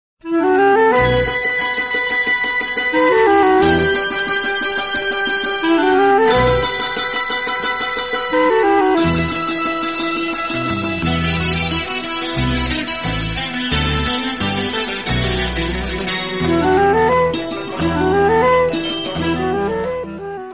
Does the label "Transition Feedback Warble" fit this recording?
No